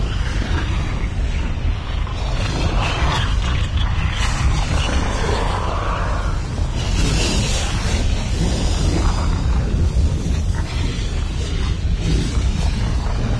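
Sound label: ambient lava; hellhole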